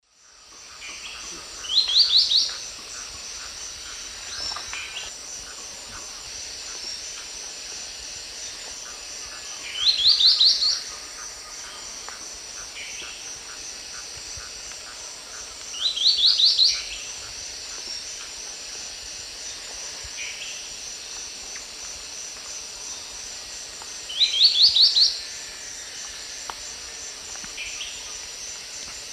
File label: Glyphorynchus spirurus, song; S. America; Eastern Lowlands